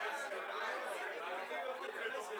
Crowd

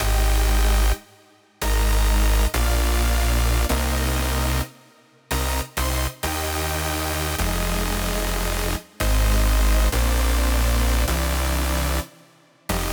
Main Synths + Bass (No Sidechain)

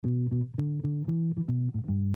Bass

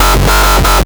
F# Whomp Whomp, Serious Dubstep Bass Hits; Oneshots

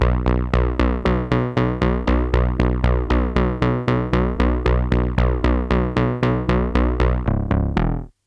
Bridge Bass; Multitrack; Remix Parts

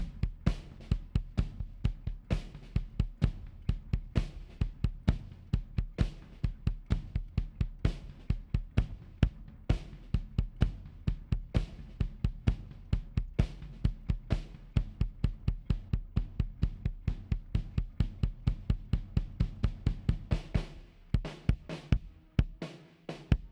Drums Kick Verse through Chorus

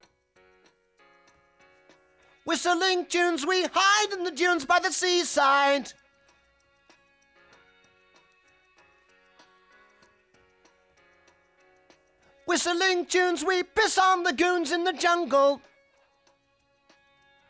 Main Vocal Bridge

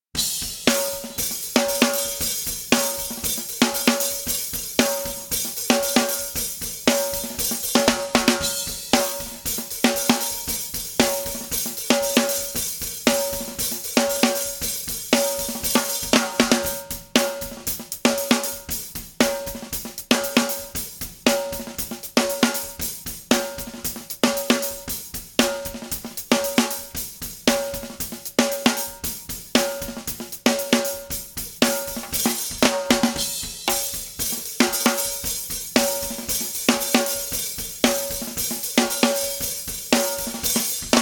drums

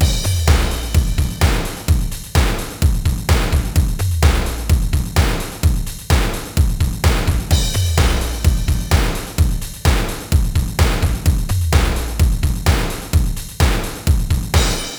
end drum loop

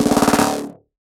Snare FX

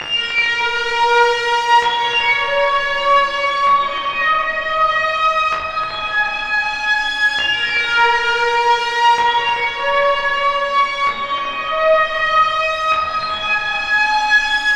Syncrosaw

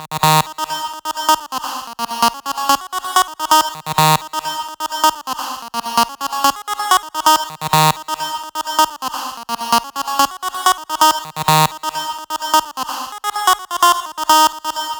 128BPM Lead Loops Sidechain - D#; MELBOURNE DROP VOL. 4

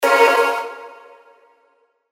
Chor Pad; Multitrack

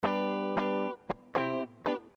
Guitar

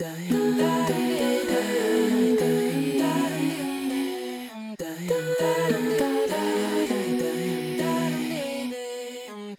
Effected Vocals Chorus; Multitrack

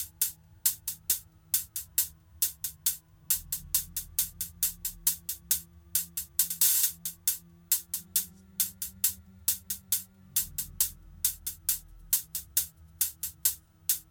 High Hat, 136; 8B

